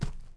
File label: thug fs dirt, footsteps